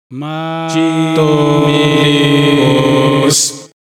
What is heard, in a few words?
Chromatic descend cromático